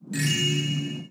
loot

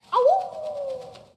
awoo

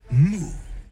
strange moo

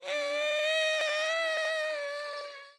vampire death

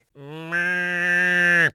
moo; Animal Sounds; Various Animals; Short calls; rufus

